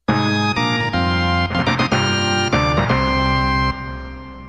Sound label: Stage Clear